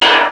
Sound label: BLAST; East West; Global Sounds